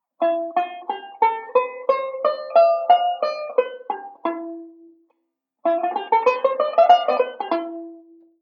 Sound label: Modal Sequence; Beyond Chord Melody Volume II